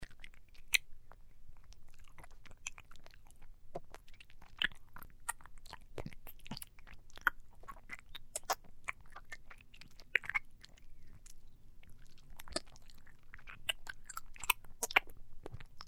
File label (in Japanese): オイルマッサージ